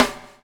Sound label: BUBL, BUBBLE; Spectrasonics - Backbeat